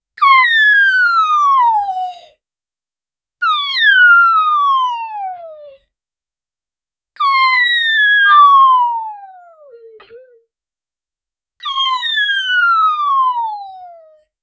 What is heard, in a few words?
year old girl falls